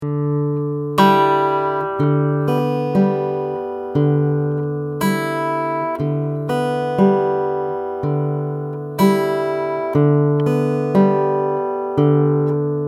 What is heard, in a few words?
Fingerstyle, acoustic guitar; alternating bass fingerstyle pattern; Alternating Bass Fingerstyle 11